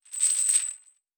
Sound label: Chain; Fantasy Sounds Bundle; Craft